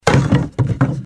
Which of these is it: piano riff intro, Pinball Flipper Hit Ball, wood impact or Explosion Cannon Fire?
wood impact